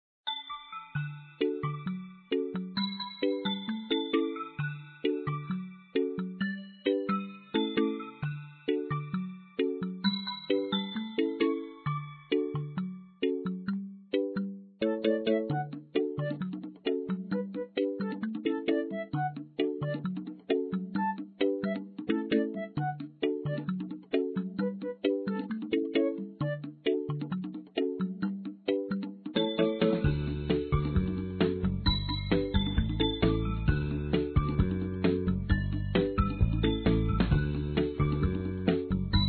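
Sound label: background music; basketball; beijingmusic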